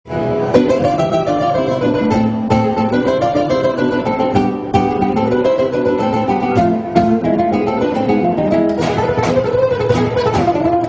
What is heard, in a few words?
guitar solo